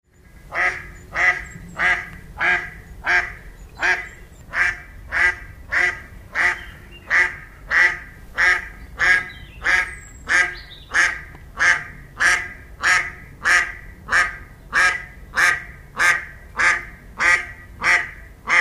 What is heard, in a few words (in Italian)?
Germano reale Anas platyrhynchos